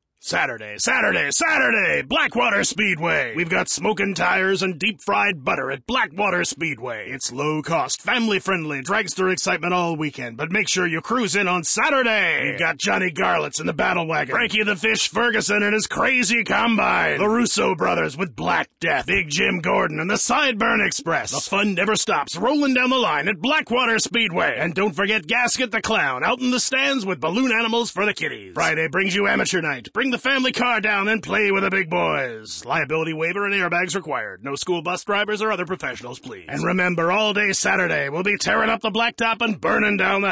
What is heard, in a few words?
Vocal; Blackwater Speedway